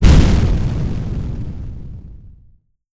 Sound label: explosion